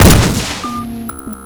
irifle fire, weapons

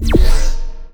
portal open red; weapons; portalgun

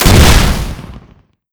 rocket fire; weapons; rpg

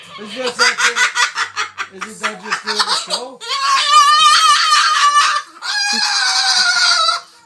cockatoo laugh; phx new taunts pack; props taunts new

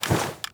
weapon movement sprint; weapons

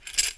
spas sling, shotgun

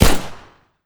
pistol fire; weapons; npc

